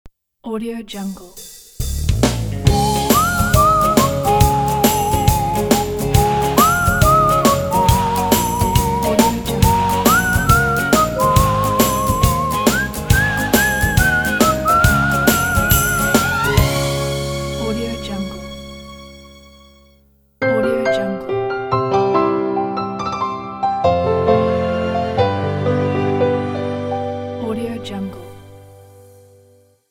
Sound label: new year logo pack